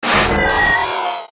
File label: Stinger Metallic Data Element; Production Element Imaging Element Accent Transition